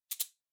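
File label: Soroban Noise, abacus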